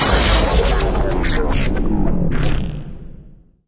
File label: Game End; ben 10 battle ready